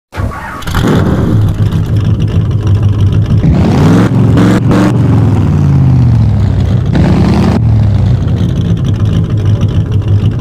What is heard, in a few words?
muscle car sound effect